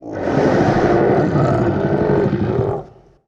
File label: Dinosaur Design, Vocals - Monster